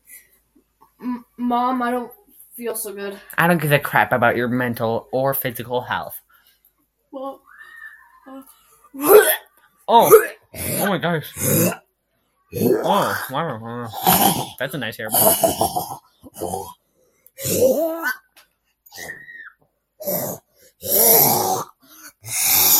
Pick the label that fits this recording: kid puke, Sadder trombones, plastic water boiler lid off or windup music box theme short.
kid puke